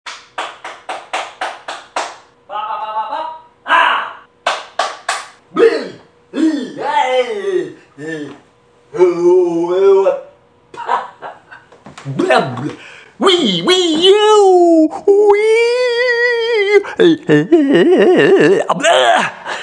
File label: dumb noises